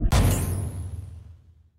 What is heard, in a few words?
Crack